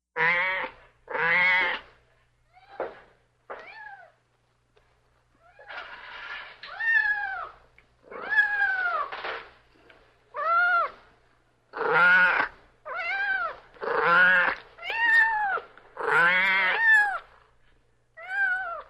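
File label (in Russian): та же самая хриплая кошка с котенком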